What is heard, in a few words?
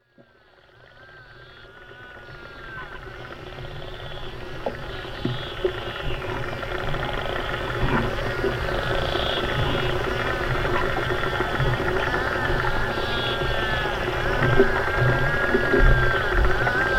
soundscape donatussee; klaus osterwald